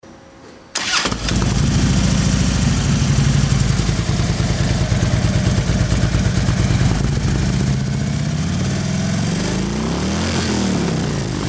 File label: harley